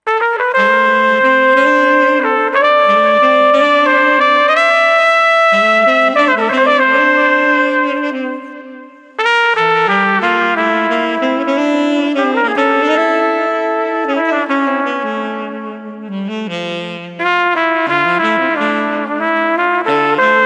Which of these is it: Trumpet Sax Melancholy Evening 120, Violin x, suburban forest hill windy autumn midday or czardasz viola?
Trumpet Sax Melancholy Evening 120